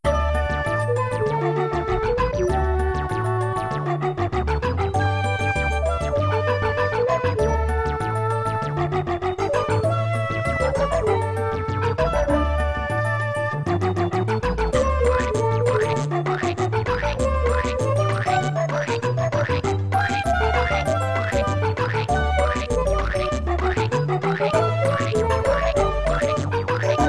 2D Paint - Music Type (Cats); Mario Artist Paint Studio

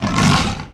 Monster Tiger type Attack

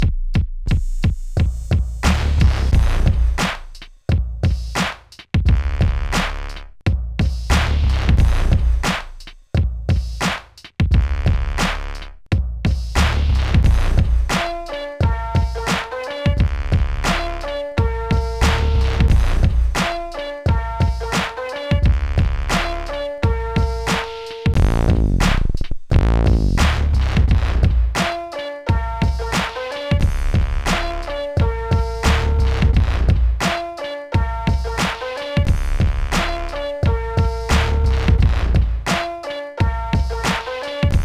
Hiphop; Sesame Street Elmo's Musical Monsterpiece; nds

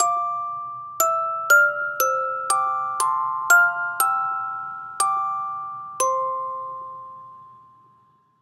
Music Box; Assassin's Creed Syndicate

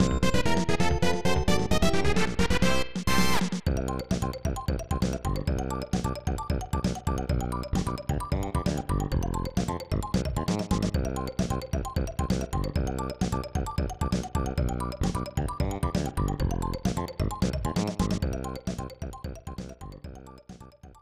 Goal; stunt race fx

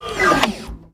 UI Fast Travel Lineage Open

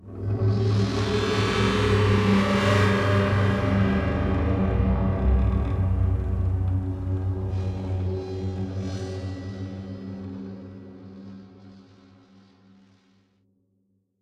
Stinger Intensity; The Park

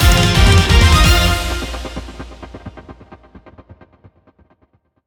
Duel Win; Yu-Gi-Oh! Rush Duel Saikyo Battle Royale

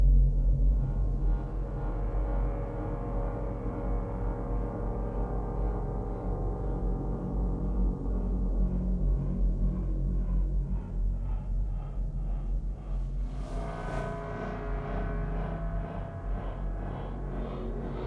drone

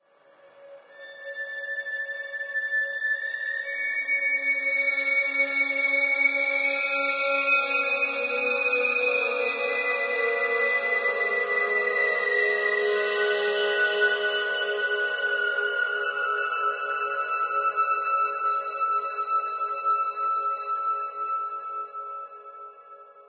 Village Sting; ether one redux gamerip 2015

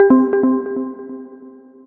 Bouncy Trance Alert

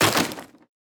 Break Wood Impact; boomerang fu

